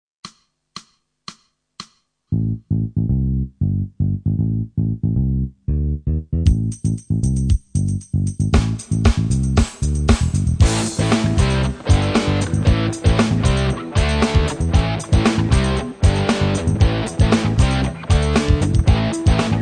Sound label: Rock House Rhythm, Rock-Metal; The Rock Metal Book - Wim Kueter